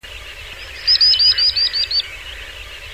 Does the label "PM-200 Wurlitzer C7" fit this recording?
No